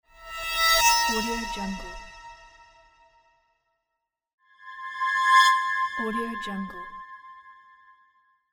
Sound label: horror stinger